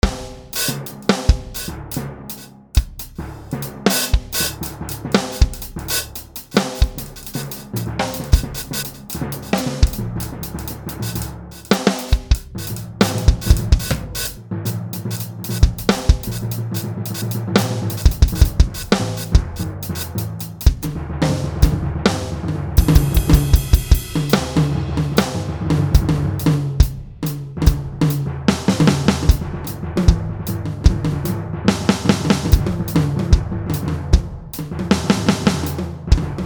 solo

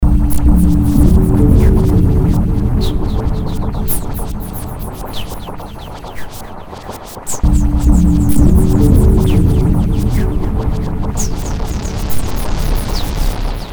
Gun battle music

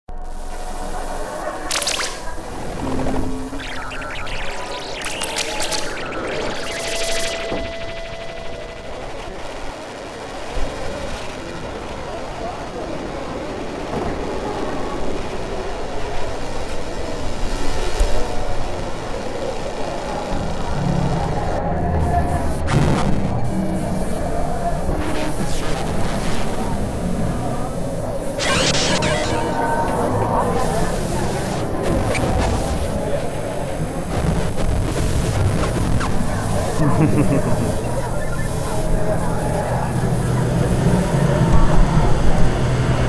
Crowd

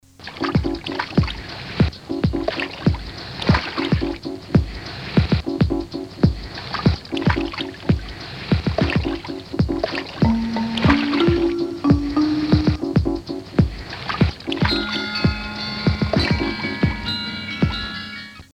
Splash Land

